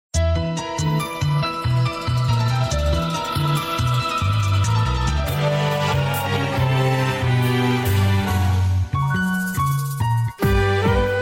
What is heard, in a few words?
Vocal Removed HD